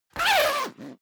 zipper down jacket open fast; objekte; reissverschluss

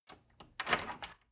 wood door old lock handling short

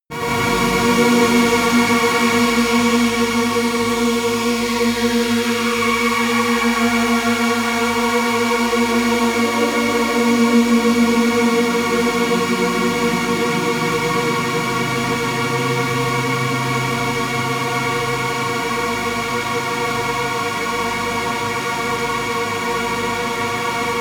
running energy, music elements

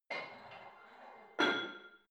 barbell plate put on hit damped reverby soft; sport freizeit; fitnessstudio

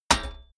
metal clad plate hit; industrie-wirtschaft

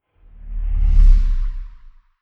sci-fi vehicle drive by metal soft; raumschiff

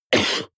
cough male single attenuated soft short; koerpergeraeusche

